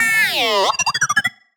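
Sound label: Sci-Fi Droid Robot Emote Neg; jasem just another shooter with electronic music windows 2017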